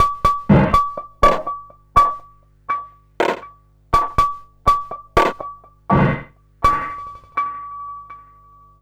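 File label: EAST percussion, drum loop; Big Fish Audio; Uncivilised G'rooves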